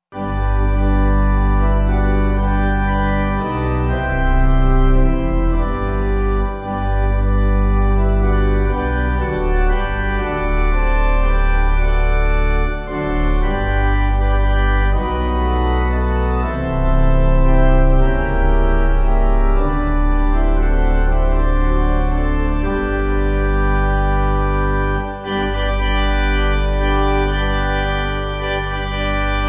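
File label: Pipe Organ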